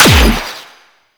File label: Air Pistol 2D Shot; Crp Air Pistol